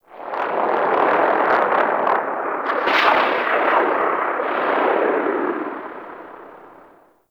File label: Gas City Ext Distant Air Rip; Warframe; Corpus Gas City; Ambience